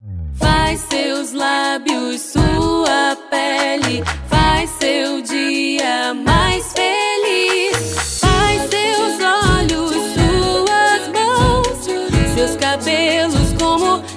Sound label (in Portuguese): Jingles